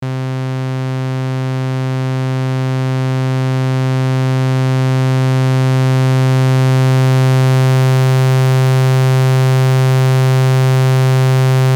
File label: Constant Osc Multidrive Level